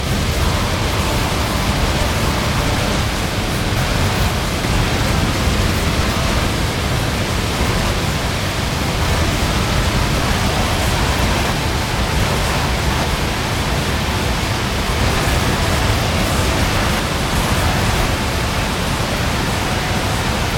Metal Tracks Averaged; The Sound Of Hell

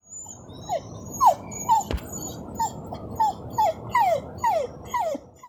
Dog Sound

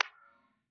Click

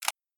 Shotgun Ready

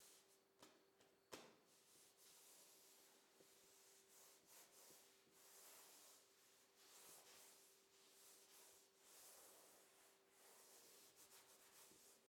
Leather

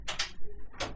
Door Opening

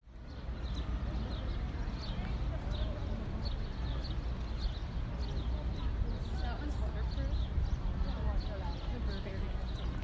Central Park Field Sound; Conservatory

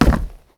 Wood Footstep

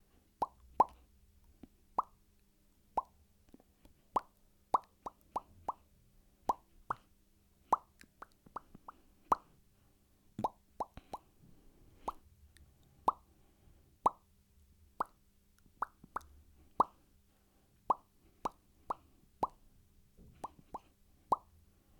Bubbles Popping

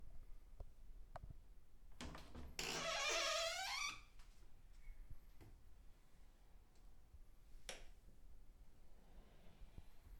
Sqeeking Doors

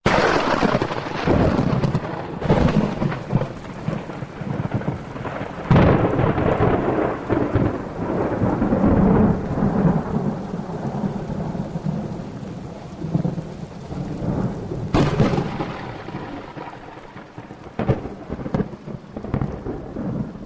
Thunder Strikes Lightning Storm Claps

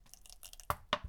Tomato Sauce Bottle Squirt

